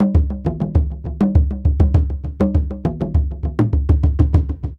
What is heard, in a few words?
African Drum 100 bpm fx; African Drums; Quazibeat; African Secret